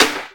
GATE SNARE

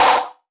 One Shot Snare, Drum N Bass - Percussion; Sonic Foundry (Sony Creative Software); Knutrix Drum & Bass

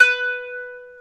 ETH KANUN, Eth Plucks, Saz & Kanun

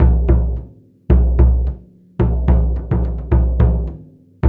Hip-Hop Gong; Spectrasonics - Supreme Beats - World Dance

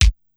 Kick, Korg Wavestation-SR, Drum Kit; Wave 455 - Drum Kit

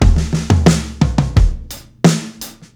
TOM GROOVE, breaks, drums; Basement drums vol 2

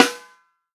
FUNK SNARE CHROMATIC, REAL DRUMS

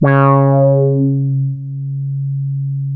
C#4, Boom da Bass; E-MU Producer Series Vol. 6 – Analogia Project (CD 1)

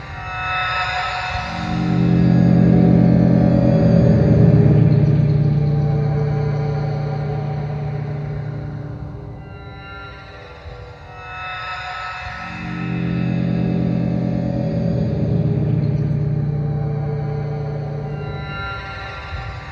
Lust Mangled Git, Guitartronics; Sonic Foundry (Sony Creative Software); Ma Ja Le Ethereal Textures

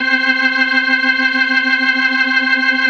C4F, B3, Vintage Keyboards

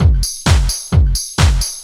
straight, Techno Trance Essentials drum loops; Ueberschall

